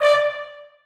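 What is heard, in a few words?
trombones staccato c#5; Sonatina Symphonic Orchestra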